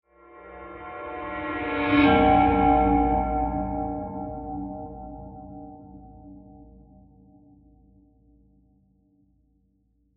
Mysterious Metallic Transition Ends With A Hit; Scary Soundscape; Crafted Signals

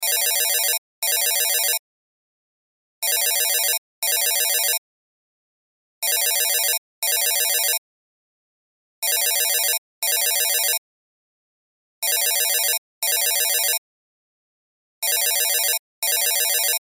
Cell Ringtone; Loop; Cell Phones; Blastwave

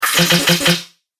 Broken Droid Bits, Robot; Robot Sound Effects

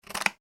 Scissors Cut Cardboard (Single Snip); Scissors Cutting Cardboard ( Single Snip ); Offices; McKinney Sound

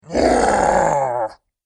Demon Hell Roar; horror; Andrew Fleming

